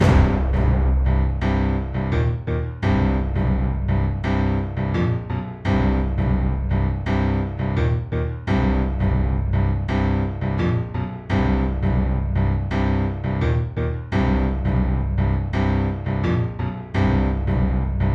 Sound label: Wierd Bass